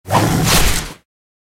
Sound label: Tiger